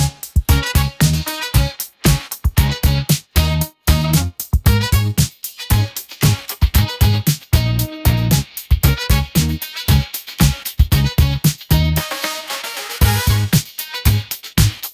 funky groove pop; ai

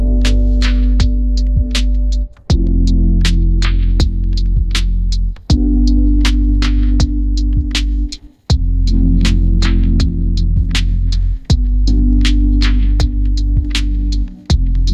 classroom chill lofi vibes, ringtones

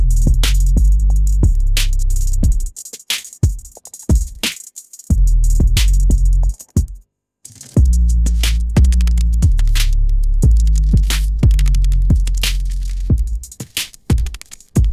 dark trap bassline drop; ai-generated

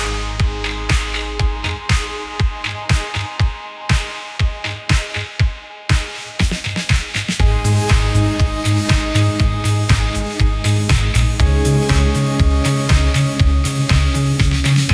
sunshine pop energy, ringtones; ai

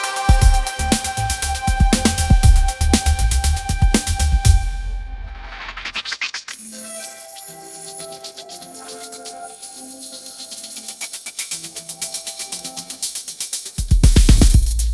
bright synth pop groove, ringtones; ai

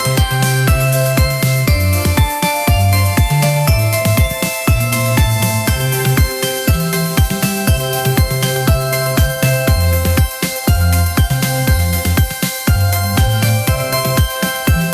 bright pop school bell jingle

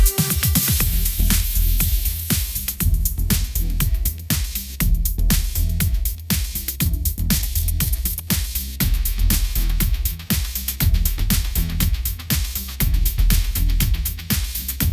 upbeat pop melody, ringtones; ai-generated

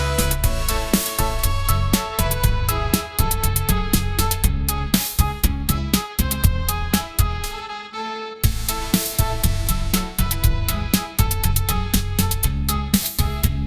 upbeat pop melody; ai-generated

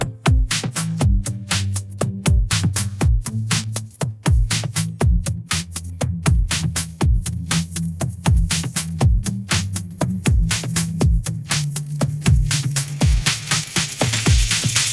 sunshine anthem groove, ringtones; ai

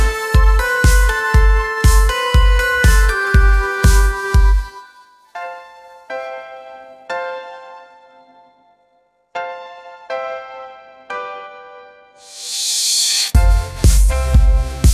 sunshine pop energy; ai

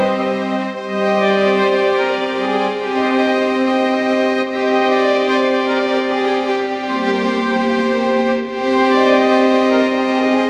orchestral strings

